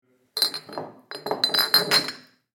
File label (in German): Kueche Flaschen nehmen